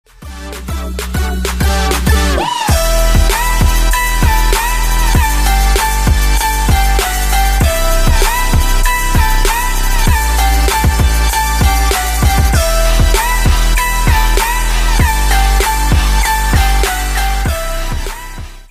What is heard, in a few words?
awesome trap song